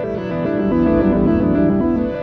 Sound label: Arp, Guitartronics; Sonic Foundry (Sony Creative Software); Ma Ja Le Ethereal Textures